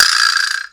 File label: Wood, Percussive FX; musicradar; cartoon caper